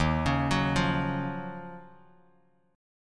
Eb Minor 9th Eb Bass Standard; Ebm9; arpeggiated; JGuitar

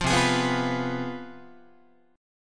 C Major 7th Suspended 4th Sharp 5th, down, Guitar; CM7sus4#5 strummed; JGuitar

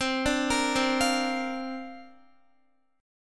C 7th Suspended 2nd Suspended 4th C down Guitar

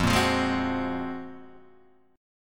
F sharp Major Sharp 11th F

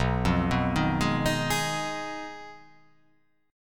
B 6th Flat 5th B down, chord

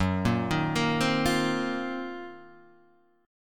D sharp Minor 6th Add 9th F sharp

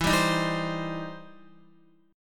E Minor Major 7th Sharp 5th E down Guitar; EmM7#5 strummed

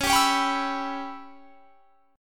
Db Minor 7th Flat 5th Db down, chord; strummed

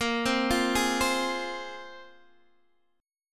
A sharp 7th Suspended 2nd, Guitar Open D chord; x,13,10,11,11,10

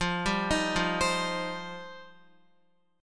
F 7th Suspended 2nd F down Guitar; F7sus2; arpeggiated

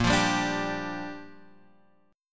A sharp 6th; strummed; JGuitar

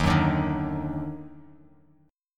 D sharp Minor Major 7th, Bass Standard; strummed

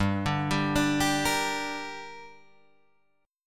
G Minor G down Guitar Open G, chord

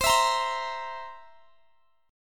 C 7th C down, C7; strummed

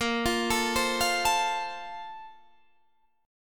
A sharp Major 7th Suspended 2nd, Guitar, Standard; A#M7sus2; arpeggiated; JGuitar

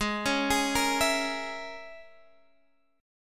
E 6th Flat 5th; chord shape

